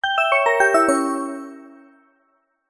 cartoon descend magical mysterious bright warm musical